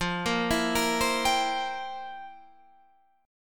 F Major 7th Suspended 2nd Suspended 4th Guitar Half Step Down